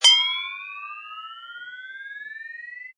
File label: Cartoon Rising Bell, Long ping, bending up; Comedy/Cartoon; Classic cartoon stuff.